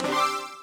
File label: ammo get